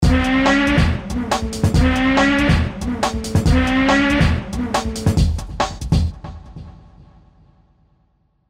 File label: Loop